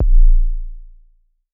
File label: D#1 Pure Tuned Kick, Tuned Sub Kicks; One Shots; Touch Loops; ElementsOne; Abstract Rhythms